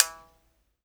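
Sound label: small mouth bow E 3; SM MOUTH BW; Heart of Africa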